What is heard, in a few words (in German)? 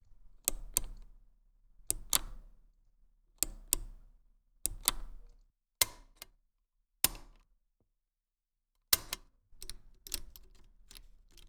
Tapedeck Mechanik